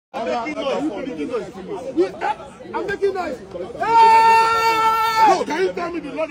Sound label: making noise